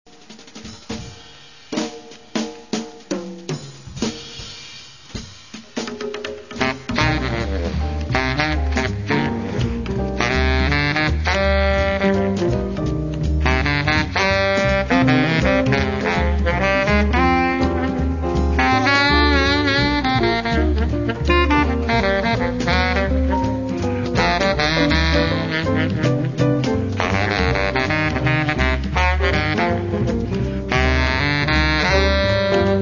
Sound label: soulful sax